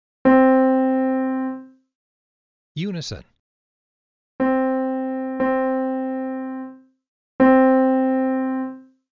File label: Unison Example